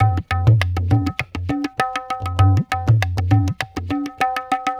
Tabla

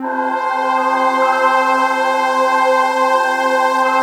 pad, drum & bass, 168 BPM; Ueberschall; Drum & Bass Resonance

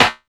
SD, Drum & Bass, 160 BPM; Ueberschall; Drum & Bass Flash Trackz

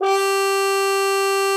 CUP MUTE; G4; Xsample VOL-3

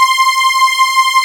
PAD WARM, Nord; Wizoo - Powered Nord; LEAD PAD HRN

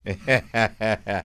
LAUGH, Blues Soul; Spectrasonics; Vocal Planet

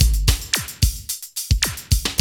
Loop, Roland; Sound & Vision - Gigapack I

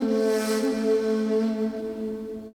SIERRA FLU

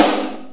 ROK SNR, FM DRUMS; East West; Global Sounds

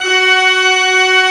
pipe, pipe organs; Greytsounds VOL-1

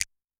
Snap; Yamaha DTXpress-II; Perc

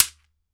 SHEKERE, African Percussion

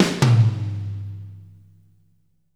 Fills 75; Zero-G; Monster Beats

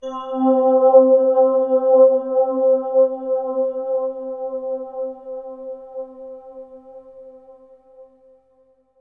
Bell Digi Pad C, Bright; Trance Formation

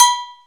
DRM D#, YAMAHA R5+RX; Best Service ProSamples vol.55 - Retro Sampler; AKAI

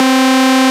C Synth, One Shots; Electronisounds; Vibration Expedition

